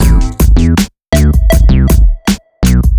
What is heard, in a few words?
ringtone, hiphop rnb; Google; NewWave Labs